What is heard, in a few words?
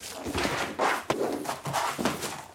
Books Flight